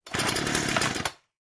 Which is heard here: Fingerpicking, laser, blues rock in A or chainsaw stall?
chainsaw stall